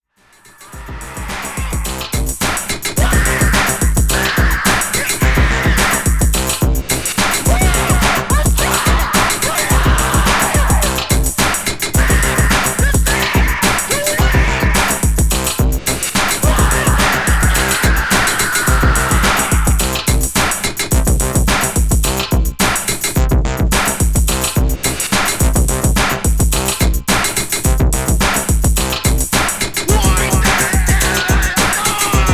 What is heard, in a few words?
Techno